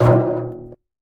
trashcan impact; midnight guns

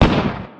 mk23 fire dist; midnight guns

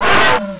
lava; midnight guns

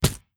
flesh bounce; impact; midnight guns

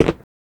foot stone, footsteps; midnight guns

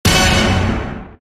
pickup enemy; midnight guns; vhs

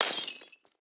glass step; midnight guns; bootsector male 00

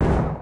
mk23 fire dist; midnight guns

